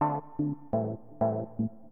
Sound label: SYNTH HOUSE 125 A; eJay; Keys